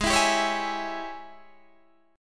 Ab Major 7th Suspended 4th Sharp 5th Ab down Guitar Standard; strummed; JGuitar